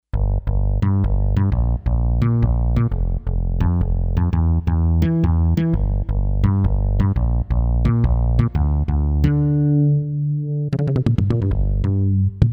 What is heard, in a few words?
Pound, Kawai K5000S factory presets